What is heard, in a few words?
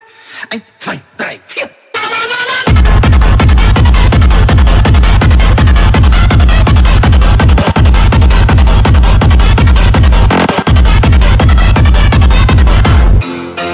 lalala hardstyle